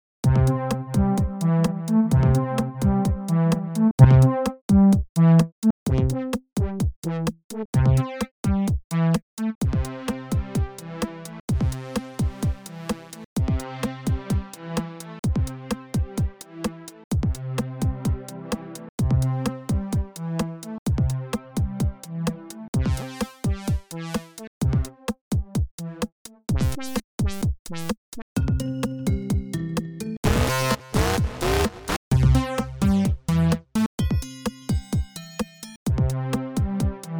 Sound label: Roland MC-707 Sounds